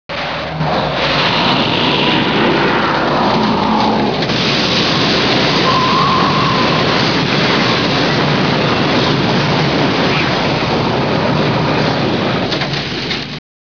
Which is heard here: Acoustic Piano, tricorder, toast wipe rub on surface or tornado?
tornado